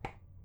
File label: click